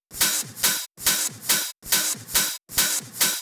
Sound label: Loop 140BPM, Drums & Perc; G minor; Vengeance Samples; Space Of Time Kit